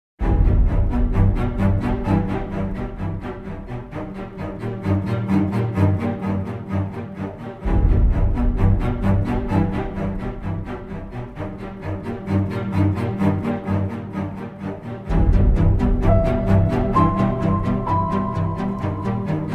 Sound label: piano melody